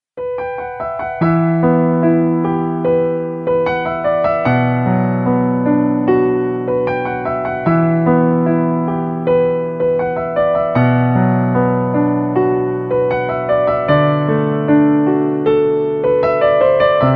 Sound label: piano bgm